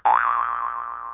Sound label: trampoline; cstrike; blockmaker